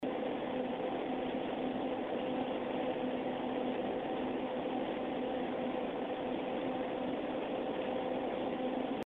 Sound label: asus silent knight hi